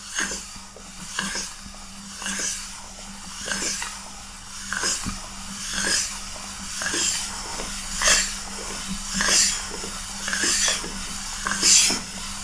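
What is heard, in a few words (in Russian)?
звук созданный для зернистого звука от поверхности к поверхности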